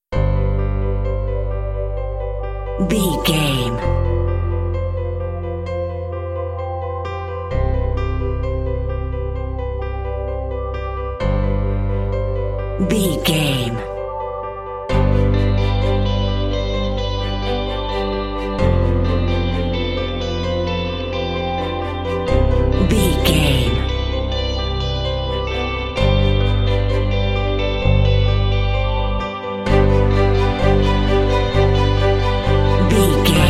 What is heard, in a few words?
anthemic ocean waves